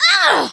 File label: Barrel Bail Female; Kelly Slater Pro Surfer; macOS, Windows gamerip